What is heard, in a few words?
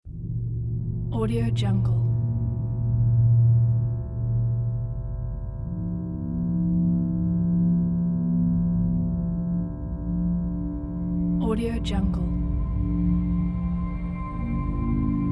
horror trailer